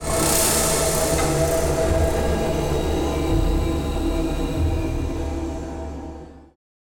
Ambience Prison Fade; inversion

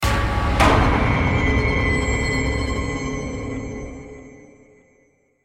Stinger Action Medium

